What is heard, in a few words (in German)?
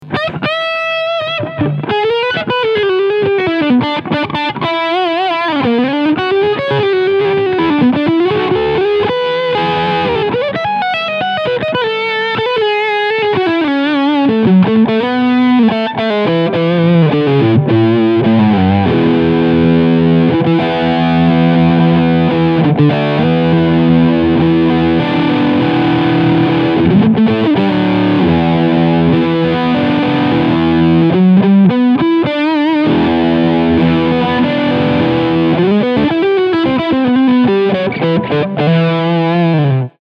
Mooer GTRS Guitars Standard 800 Analog Overdrive Steg Humbucker